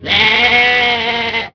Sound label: goat